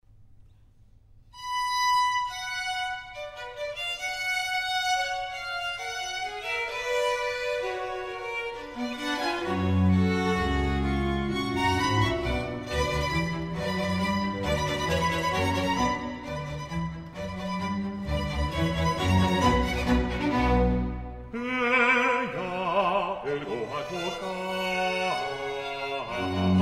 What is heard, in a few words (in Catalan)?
Salve Regina en Sol menor Eja ergo advocata nostra moderato Moderato